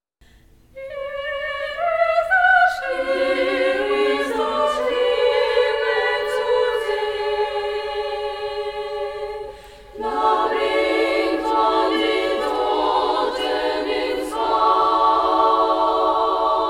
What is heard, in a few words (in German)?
schlusschor; phoenissae